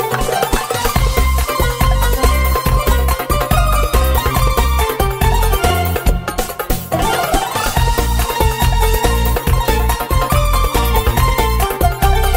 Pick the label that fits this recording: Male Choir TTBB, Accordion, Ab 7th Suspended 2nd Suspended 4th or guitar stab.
Accordion